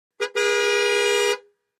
Aston Montego Int Hrn; horns

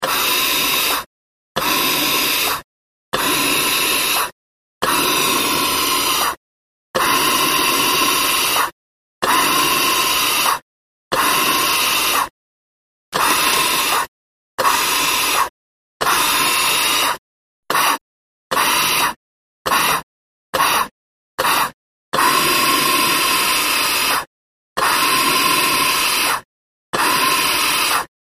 Respirator Pumps Oxy

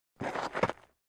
Baseball FS Cleats; baseball fx & games

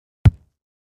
Ground Hit Sledge Ham; rocks & dirt

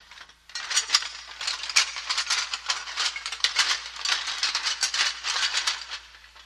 Armor Mvmt While Sta

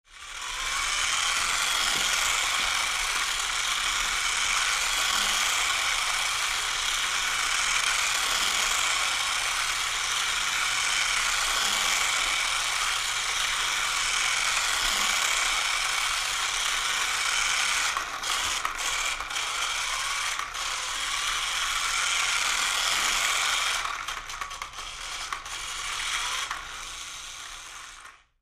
Radio Controlled Electric Car Races Around Room

